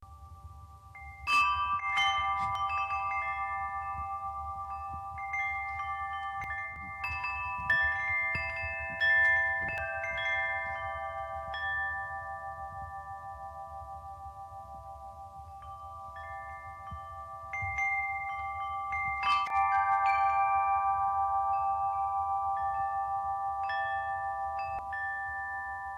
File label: Sounds From Dojo Wind Chimes; Planet Mars